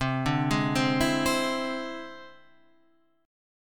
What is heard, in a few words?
E Augmented 7th C